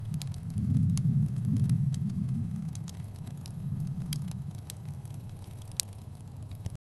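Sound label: crackling campfire distant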